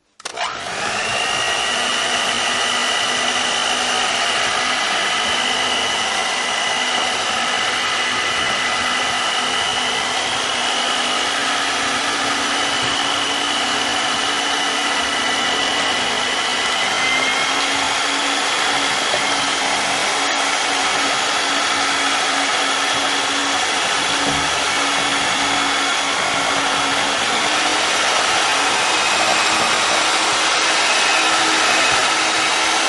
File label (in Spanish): Sonido de batidora